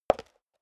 step high heels concrete single left; schritte; Footsteps

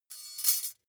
midsize metal thin clank catch coarse

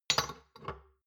shot glasses stack soft; berufe; gastronomie; soundpool

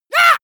monster assault high; schreie

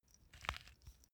small pepper grinder glass ringing soft; industrie-wirtschaft; gastronomie

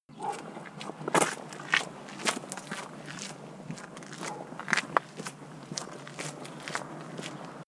step gravel walk

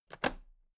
toolbox latches open heavy; industrie wirtschaft; handwerk

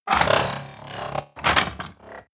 transformation rattle hum

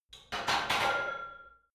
barbell heavy plates replace rack reverby hard

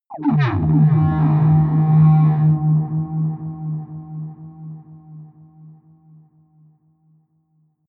atmospheric feedback distortion short, drones

